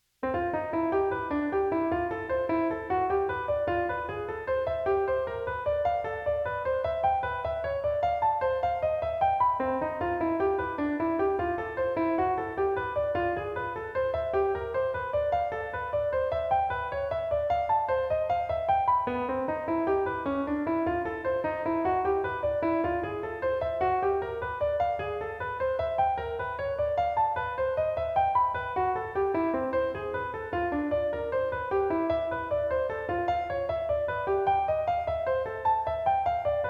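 Major Scale Seventh Chord Embellishment, jazz piano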